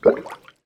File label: coin drown